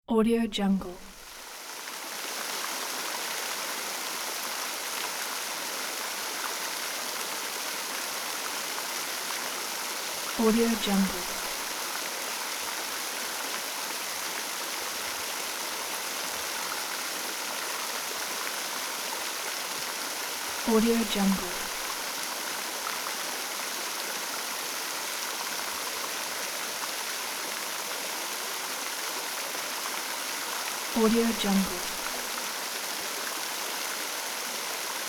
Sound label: town square water fountain